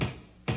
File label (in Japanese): ハンマードラム; ドナルド